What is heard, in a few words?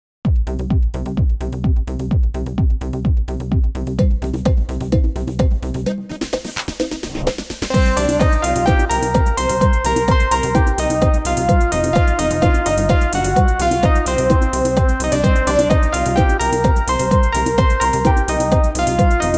CHA CHA